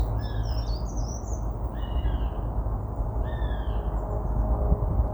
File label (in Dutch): Havik Accipiter gentilis; dieren; accipitridae; Atlas van de Vlaamse broedvogels